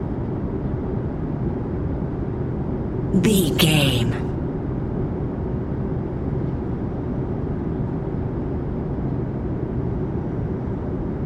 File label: background room tone loop